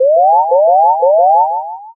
scan

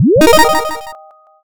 boot

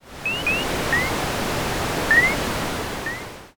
forest

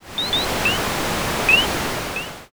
forest

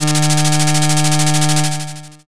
slot spin

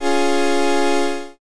brass

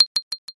hyoshigi

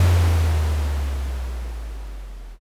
distant thunder

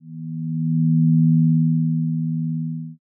zero g

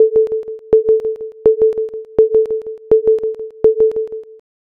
railroad

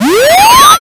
charge

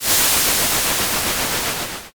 grill

